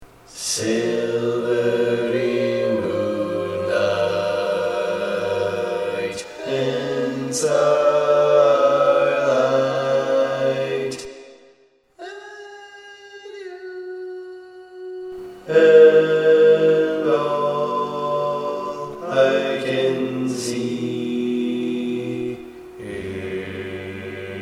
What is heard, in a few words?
Bass, barbershop tags